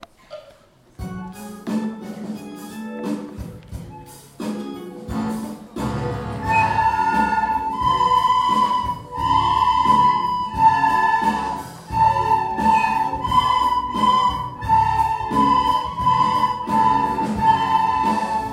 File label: music concert